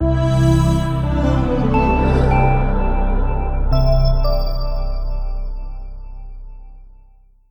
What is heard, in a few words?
District Jingle; gamerip; door to door